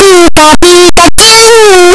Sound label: pikachu cry; pokeyellow